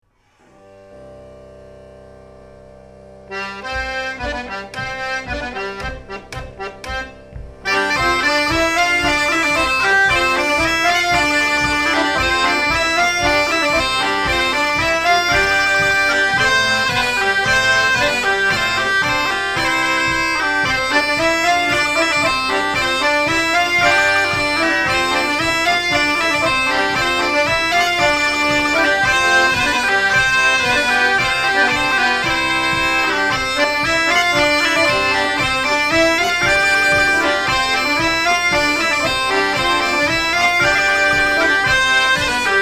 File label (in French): Branle Instr Do; Diable ton fourbi